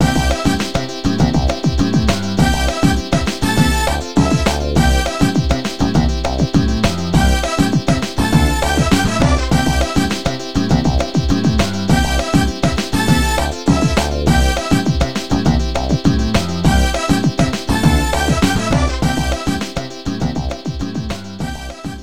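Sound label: background music happy; My Frogger Toy Trials; Nintendo DS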